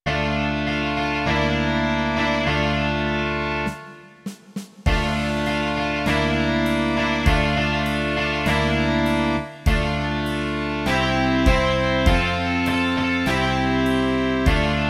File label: rock